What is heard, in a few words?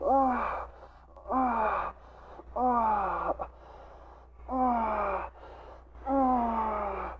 sex; Mafie 1